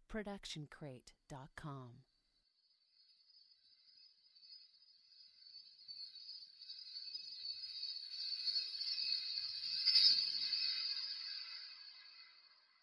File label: reverse shimmer; soundscrate